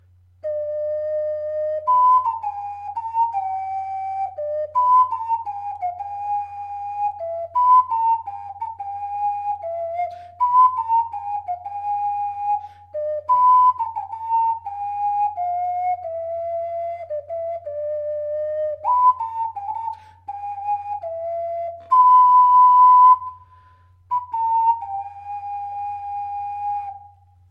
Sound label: theme ocarina